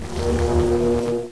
buzz, hornet; XDM

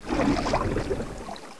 swim; XDM

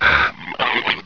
zombie alert; XDM

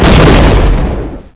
explode; XDM

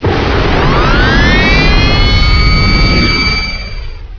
buzz; hl1mp; XDM

